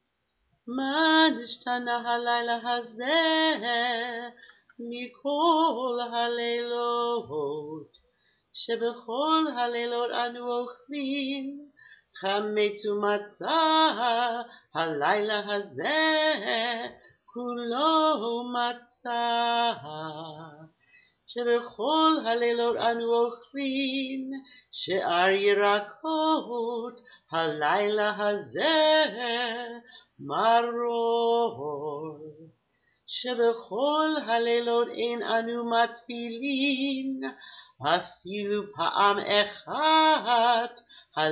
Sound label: Four Questions Traditional Chant